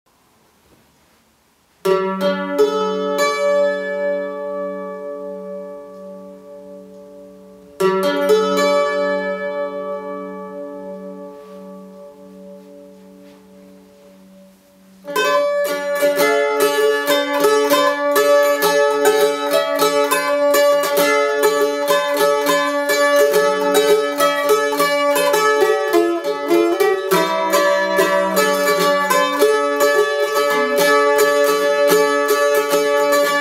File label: Mandolin